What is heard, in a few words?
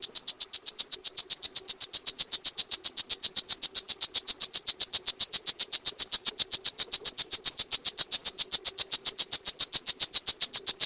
oophaga pumilio; costa rica 2013